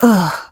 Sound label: ugh; ningguang